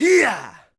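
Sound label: Axeman Attack Vox; Civ4 warlords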